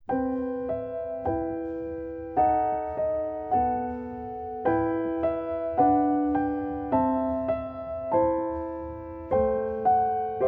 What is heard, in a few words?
Rhythms of Raindrops (Instrumental)